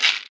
raw shaker sample d key, shaker one shot tuned to the D note. Loudest frequency: 3176H